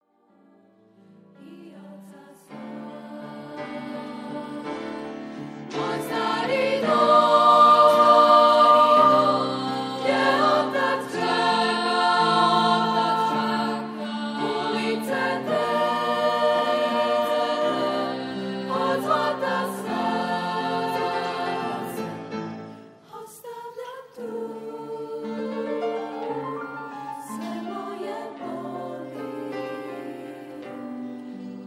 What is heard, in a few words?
blues